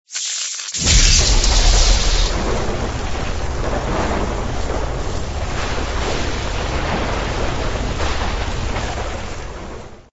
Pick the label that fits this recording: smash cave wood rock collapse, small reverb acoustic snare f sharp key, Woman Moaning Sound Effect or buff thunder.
buff thunder